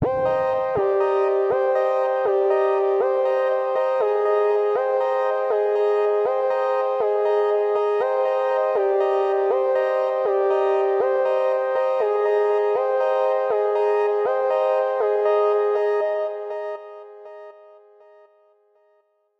in orbit

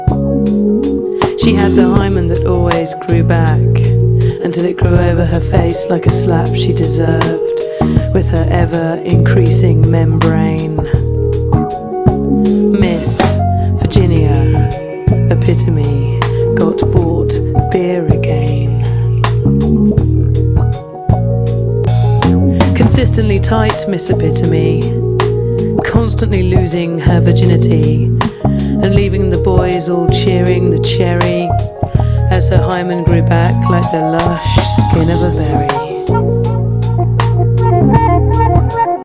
Trip Hop Music

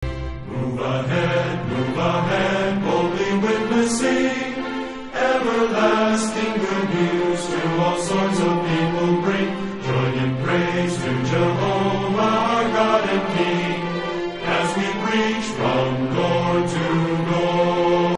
Kingdom song; new song book of JW

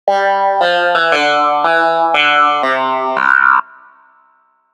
duck Clavinet

